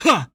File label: Male Attack Short; SandBox Musics